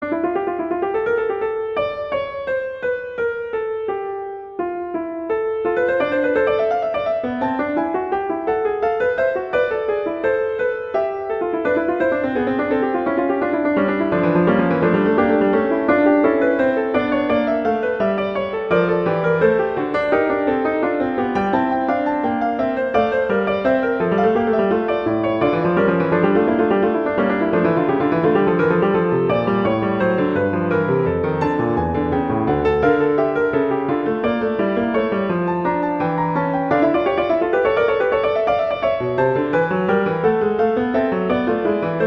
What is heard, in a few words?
Fugue; Bach; Prelude Fugue VI